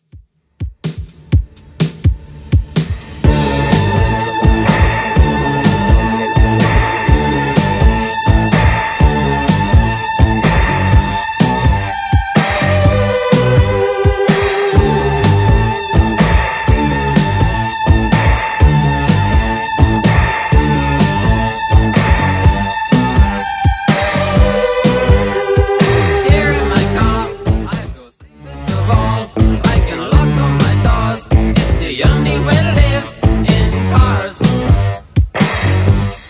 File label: 80s Redrum 125bpm